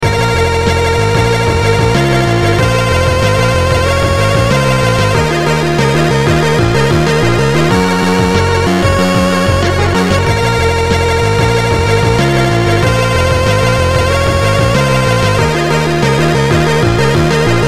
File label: GAME RINGTONE, INSTRUMENTAL; 3D SAPPER